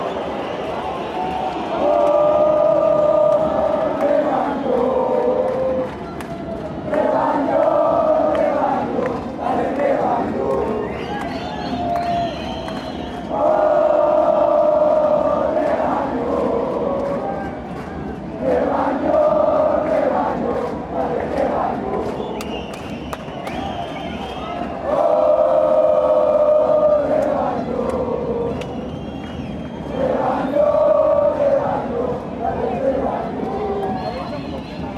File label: oh rebano, chants